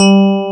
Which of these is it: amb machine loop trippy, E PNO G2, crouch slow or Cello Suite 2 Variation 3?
E PNO G2